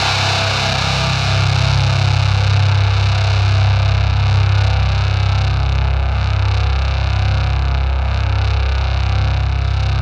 ZWEEP; Spectrasonic Distorted Reality 2

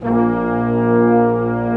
brass A2; AKAI S-Series CD-ROM Sound Library VOL-2

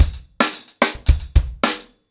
110BPM, ACOUSTIC DRUMS

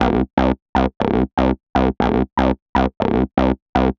Acid Hard, Bass; Sonic Foundry (Sony Creative Software); The Electronic Music Manuscript Collection; Bass Foundations

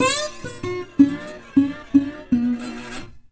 Blues Guitar Samples; ILIO - Fretworks

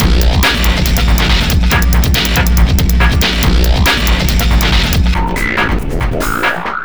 Processed Beat, Drums & Percussion

